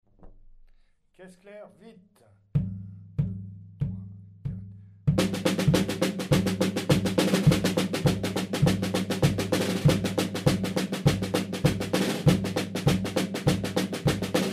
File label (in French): caisse claire vite